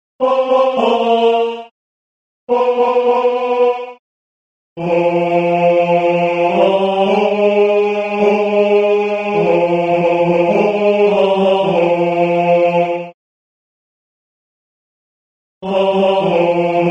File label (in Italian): tenore; I Got a Home in Dat Rock